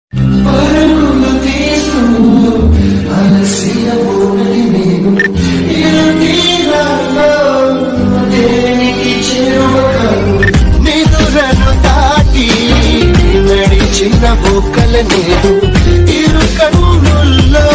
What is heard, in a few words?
song ringtone, sad ringtone, bgm ringtone; arya movie; alluarjun